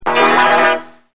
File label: guitar